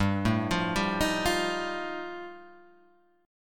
G 7th Suspended 2nd Sharp 5th G chord

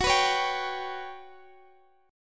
G Major 7th Flat 5th F sharp

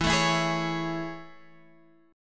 A Major 7th E

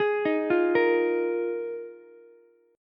Eb Suspended 2nd Suspended 4th Eb Ukulele; Ebsus2sus4 arpeggiated; JGuitar

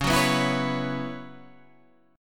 B Suspended 2nd Suspended 4th Db

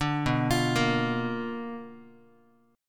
B Minor Major 7th Flat 5th Guitar

